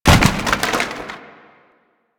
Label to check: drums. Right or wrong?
Wrong